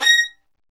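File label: strings violins; L-CD702; Vlns Marc4-5; Vls Marc4 x8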